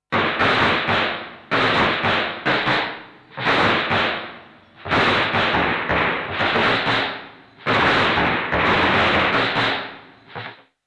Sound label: lofi crunch